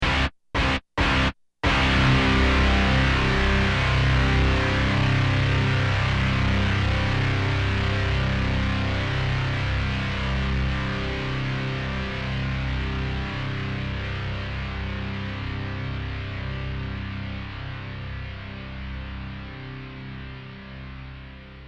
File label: Pan flute Ending